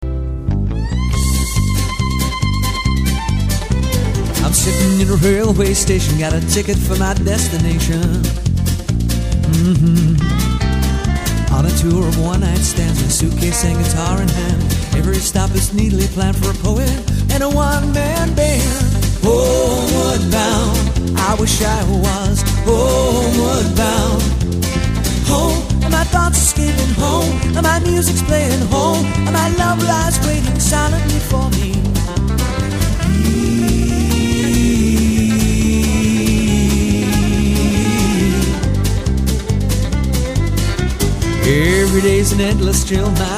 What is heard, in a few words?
Fiddle